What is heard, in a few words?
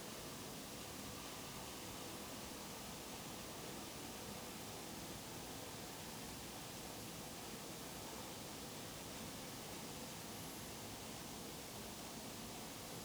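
hydrophone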